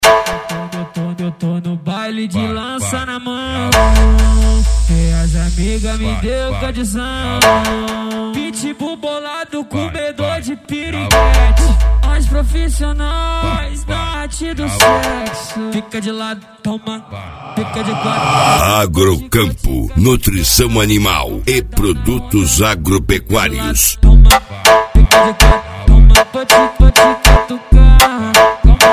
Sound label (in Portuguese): HIP HOP E FUNK; AGROCAMPO 2022; PARANAIGUARA GOIAS